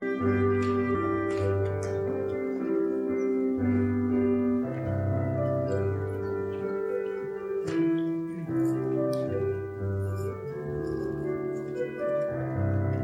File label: preservice, instrumentalists